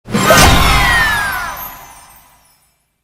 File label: (Jingle) Platinum Cup